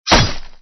sound attack hit; hitsound

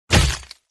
sound attack hit; hitsound

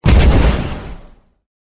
skill bullet

